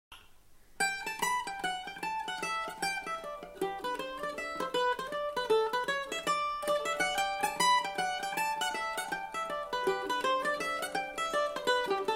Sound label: Mandolalin